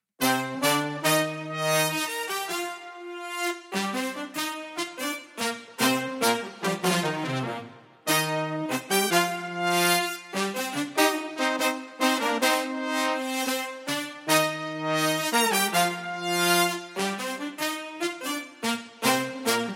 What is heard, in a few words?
Brass